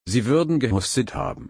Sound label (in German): husten